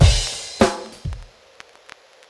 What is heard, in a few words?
drbreak, drumbreaks; Masterbits; Vinyl Attack